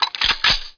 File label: m16 load